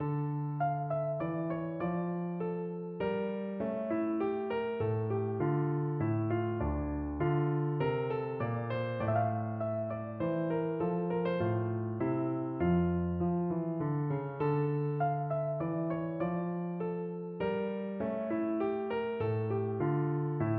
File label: bach minuet in d minor piano; bwv anh 132